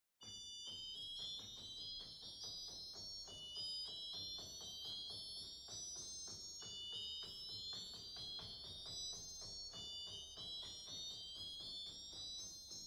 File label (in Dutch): Cimbel; zwolle